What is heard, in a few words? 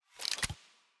case win